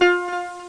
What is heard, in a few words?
GEN PLING; ALLSTARS; eXtended Instrument